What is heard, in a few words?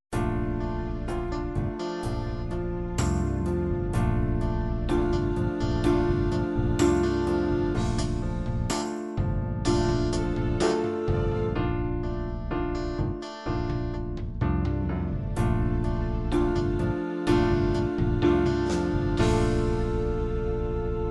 ballad american